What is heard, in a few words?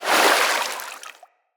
Hoverboard Terrain Caster Fluid; Vehicles; Venus